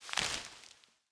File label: gather fruit